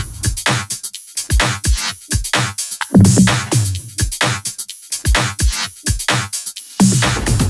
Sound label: 128BPM Kit; Vengeance Samples; Dumbflange Kit